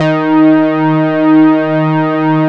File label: Juno 106 fat Eb3